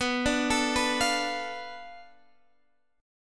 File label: B-Minor 7th Flat 5th B down; arpeggiated